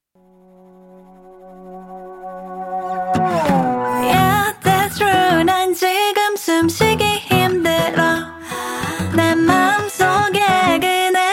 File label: Acoustic